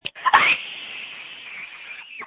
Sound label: Scream